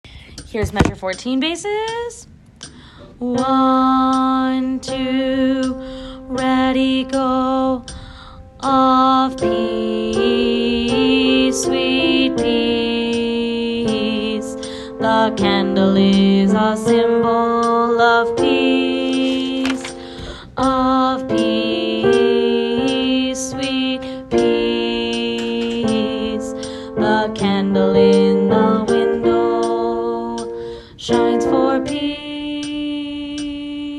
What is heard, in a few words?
bassi